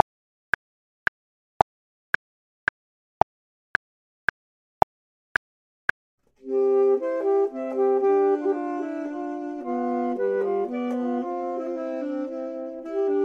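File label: both, Saxophone and Clarinet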